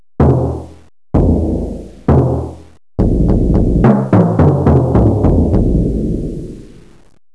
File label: Timpani